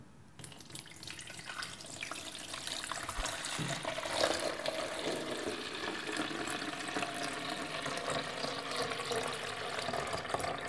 Wasser einschenken

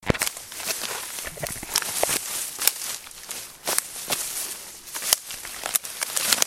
Laufen durch Gestruep

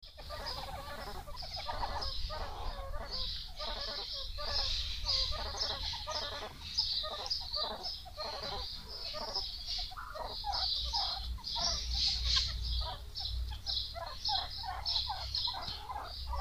Erdmännchen

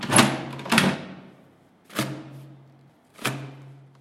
papierspender

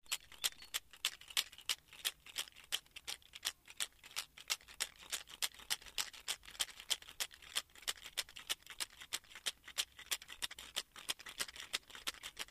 rassel

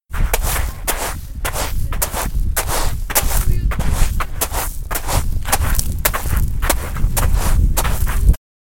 Kieselsteine

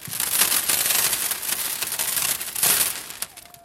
kies faellt auf splittkasten

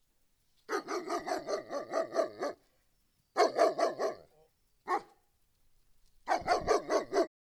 hundegebell

